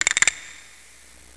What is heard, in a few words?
CASTANET; MUSIC; PERCUSSN